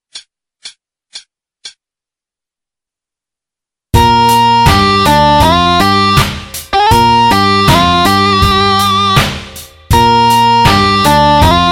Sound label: blues g riff all 80